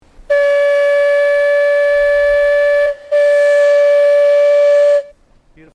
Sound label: steam whistle